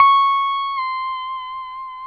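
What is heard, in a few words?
pedal steel bend down C#6, Right; PEDSTL BENDS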